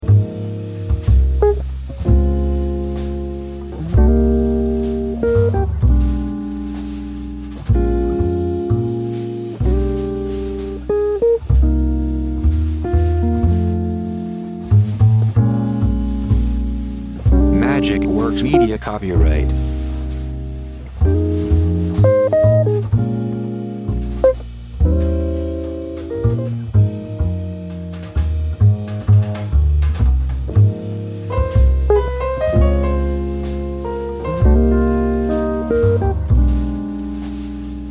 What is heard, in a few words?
jazz